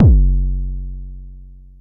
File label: Bassdrum, Jomox Xbase09